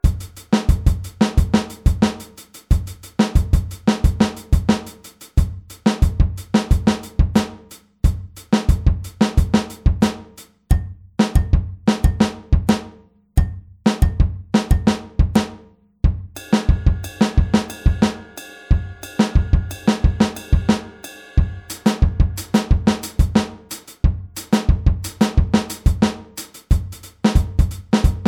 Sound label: Groove all